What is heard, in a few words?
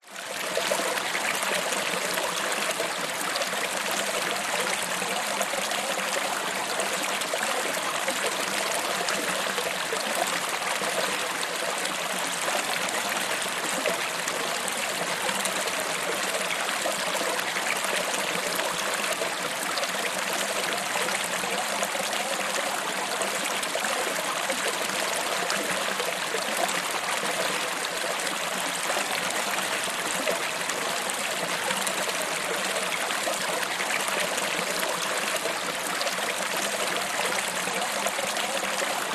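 Mountain Stream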